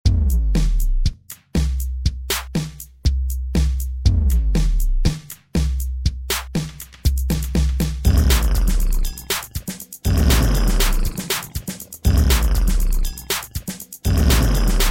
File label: Sloppy Beats